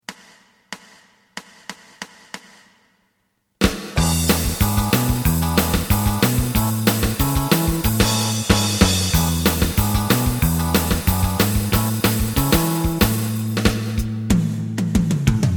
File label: lead